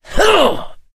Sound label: melee swing, mechanic, survivor voice; source engine; left 4 dead 2